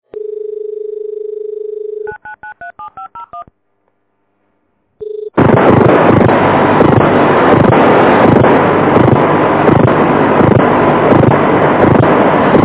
wierd line, sound of modems

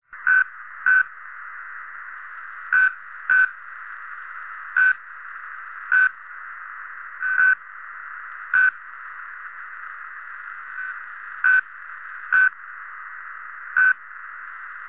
A possible LF Navigation signal

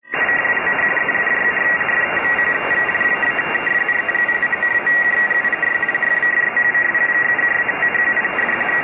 RTTY Transmission

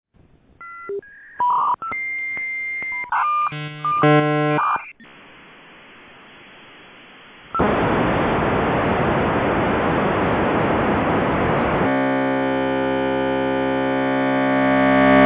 v92 moh denied, sound of modems

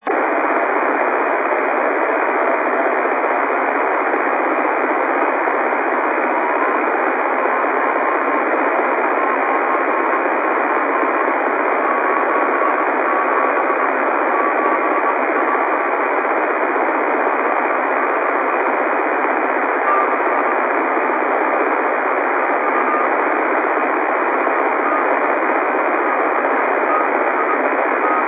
fo-29 jas2 cw, Satellite Recordings; 9:02pm Pass cw; I-Com IC-R20

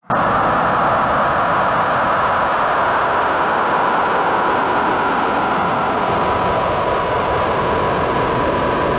DRM OFDM; HF; digital radio mondiale which allows AACplus encoded audio to be transmitted through HF

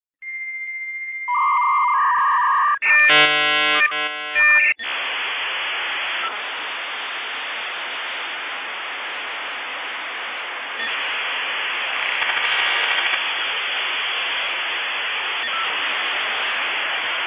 v90 ambient tech intel, sound of modems

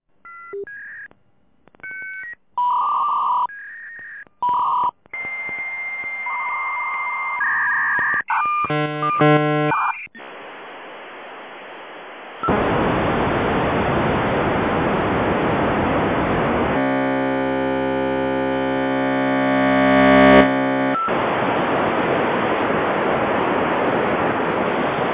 v90 smartlink soft, sound of modems

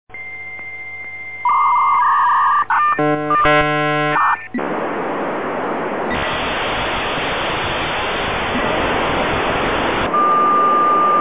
v34 fail, modems

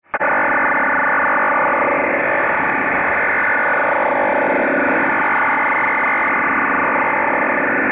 unknown fsk ofdm; OFDM Unknown 3

